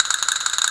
Castinets; musicradar; cartoon caper

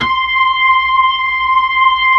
B-3, Blues; Roland LCDP10 Keys of the 60s and 70s 2

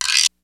RY-30 PERCUS; Rubber Chicken Software Sample Bank

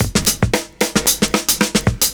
70s Breakbeats; USB Soundscan vol.46; AKAI

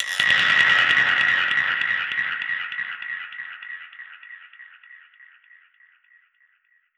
Perc Hit, dub percussion one shots; musicradar

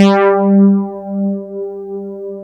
RUST PAD G3, MKS 70; Northstar - New Gold VOL-1

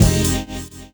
Chords Tones; Equipped Music; Loungin House

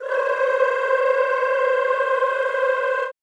CHO C4, Rrrr; Sound & Vision; Gigapack I CD 2; CHO Mmhh + Rrrr

